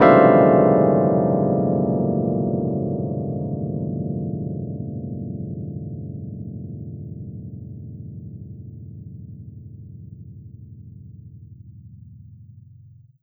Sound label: E. Piano; Keys